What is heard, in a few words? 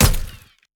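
pistol up, impact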